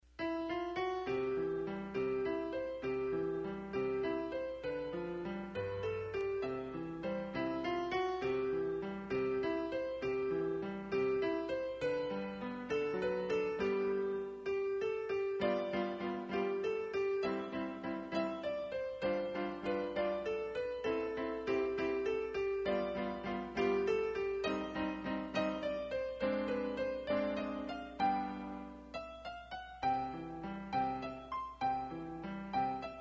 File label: piano